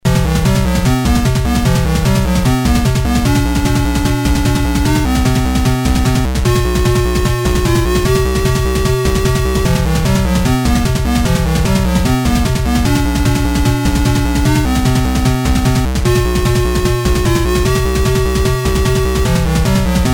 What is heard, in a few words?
Boss Music